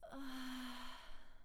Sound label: pleasure moderate; VIVAE